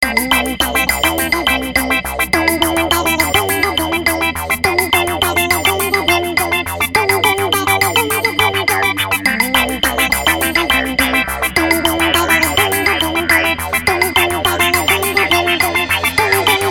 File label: Level Theme (Ice)